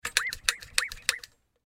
Saw Wiggle Bend, Saw Wiggle Bend Blurp Industry Tool; Cartoon; Cartoon Sound Design